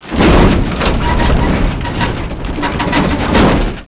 drawbridge

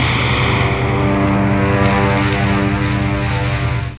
BLAST

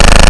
gunshot

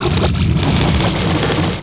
MAGIC

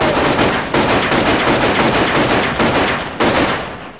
gunshots

